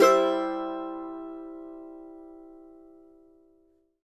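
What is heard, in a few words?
MANDO MAJ C major down, Left; Sampleheads; Whole Lotta Country 1 & 2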